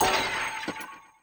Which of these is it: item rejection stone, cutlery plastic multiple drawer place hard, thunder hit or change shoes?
item rejection stone